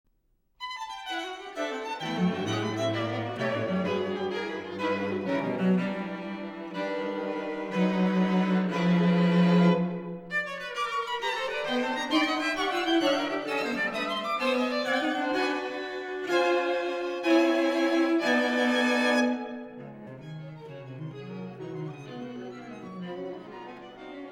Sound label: string quartet